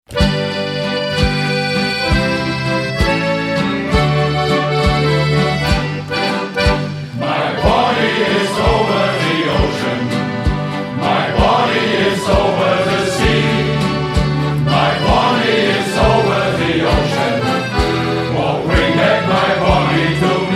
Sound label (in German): My Bonny auf hessisch